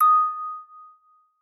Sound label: musicbox